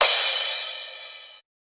crash sound f key, Royality free crash one shot tuned to the F note. Loudest frequency: 5697Hz